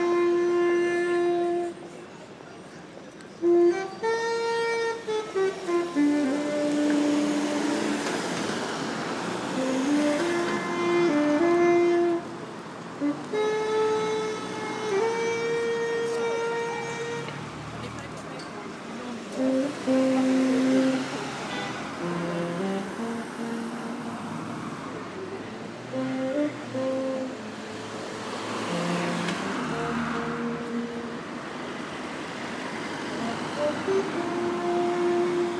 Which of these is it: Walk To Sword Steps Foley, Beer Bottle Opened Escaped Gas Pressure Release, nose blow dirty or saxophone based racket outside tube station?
saxophone based racket outside tube station